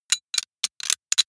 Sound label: sci-fi handling click